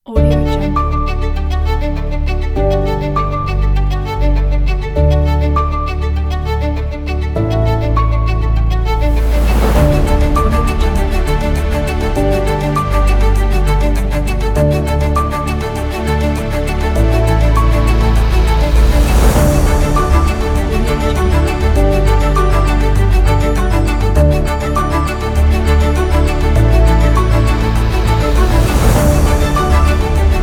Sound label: classical music